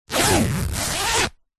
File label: clothing jacket cloth zipper; zapsplat